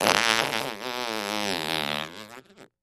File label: Close Up Fart